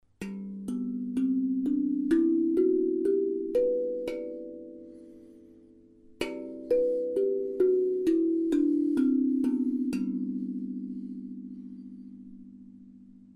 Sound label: Equinox scale hands